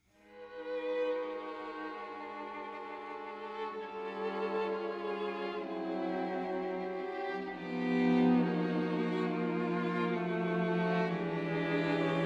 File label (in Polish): kwartet smyczkowy